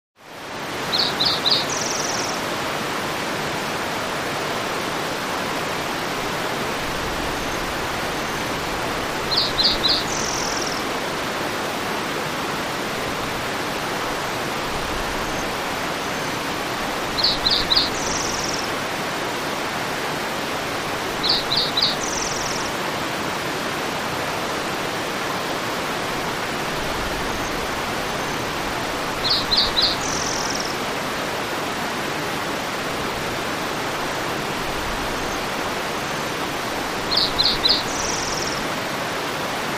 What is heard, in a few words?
Jungle Ambience Clos; backgrounds